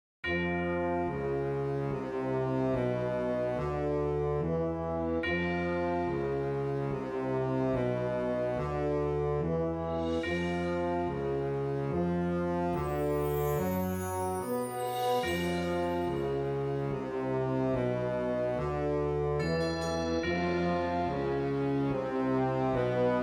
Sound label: Firebird Suite